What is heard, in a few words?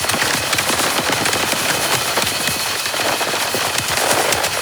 Cannister Fire Loop; Enemies; Abilities; Warframe; Tau; Dax Archer